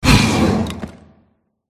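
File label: wyvern death; viverna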